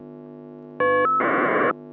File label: fsk